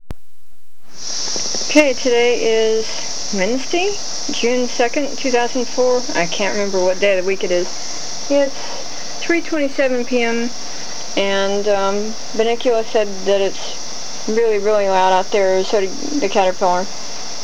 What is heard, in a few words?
cicadas